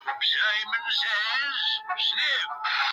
sniff; simon says

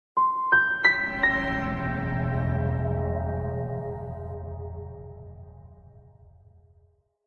incorrect investigation jingle; la noir

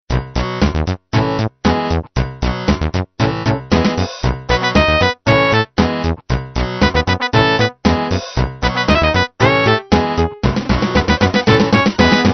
8 bit

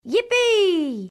yippi

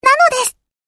battle start; inazuma

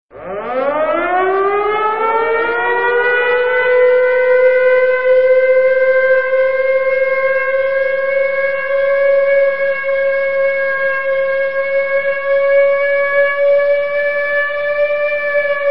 war siren; conkers bad fur day

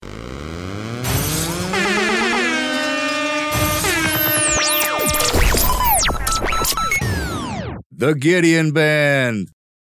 jingle

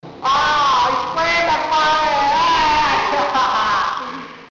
scream everybody

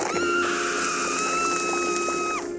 impaled scream; htf giggles

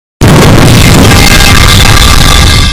metal pipe

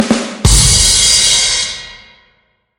ba dum tss

